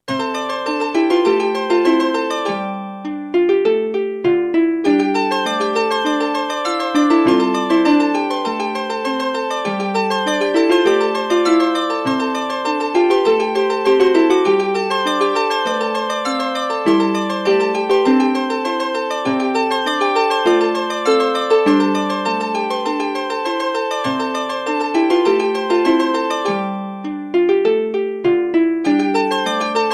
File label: koto